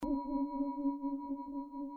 SATELLITE CALLING SOUND EFFECT

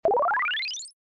multimedia game one up extra life; zapsplat

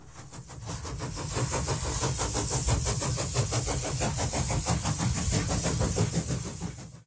train Passing

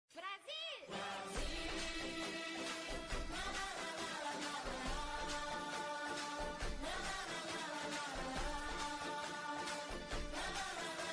brazil la la la la

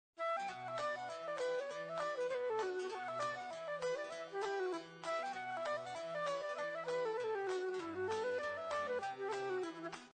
celtique Irish Folk

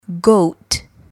goat

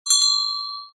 bell icecream

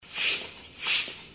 air pump sound effect

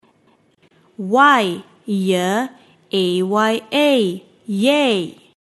yay

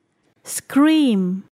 scream

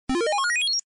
ascending Pitch Tone SOUND Effect

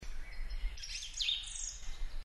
free birds chirping sound effect

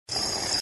stack brick

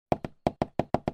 animals bird peck at wood surface; zapsplat

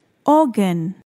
organ

